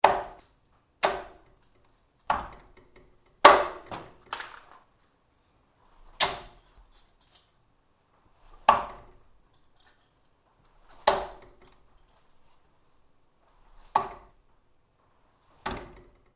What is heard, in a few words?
Darts into Board - Various; Sports